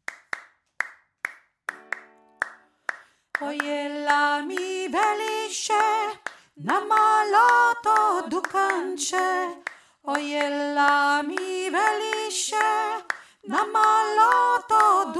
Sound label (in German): oy ela mi veliche hohe stimme